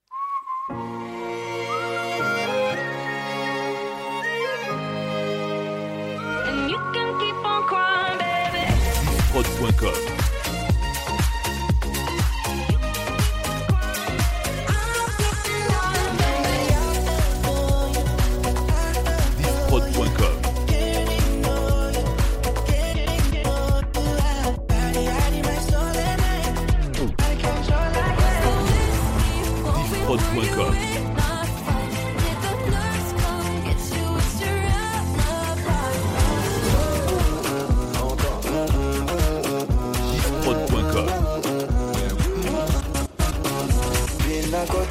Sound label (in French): Medley Hits 120 BPM